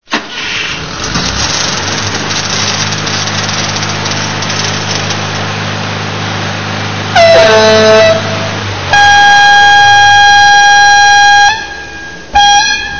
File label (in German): hilfswagen